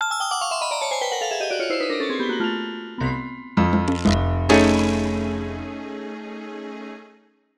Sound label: Lose